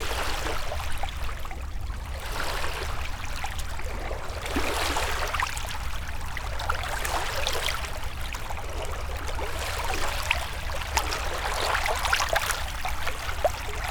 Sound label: waves Strongest; Oxford Island Lough Neagh; Sound Scapes